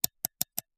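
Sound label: computer mouse click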